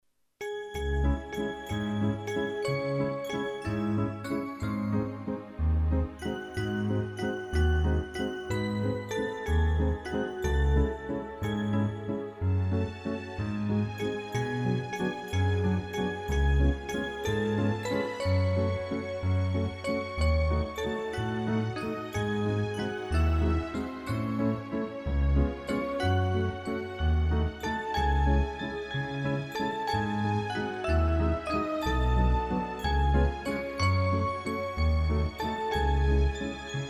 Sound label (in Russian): Мелодия на часы; 5 октября День учителя